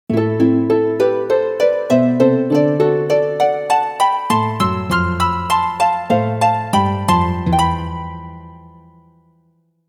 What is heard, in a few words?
Harp; Dinosaur Resurrection